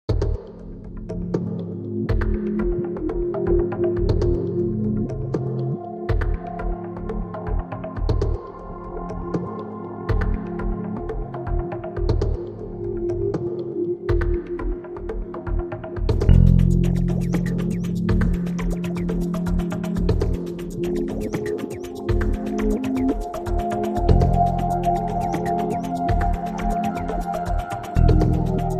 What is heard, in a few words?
Neutral Rhythmic Background